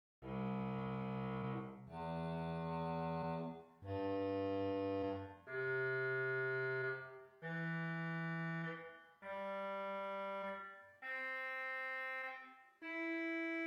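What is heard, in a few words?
Swell Clarinet 8 arpeggio, organ; St Annes Moseley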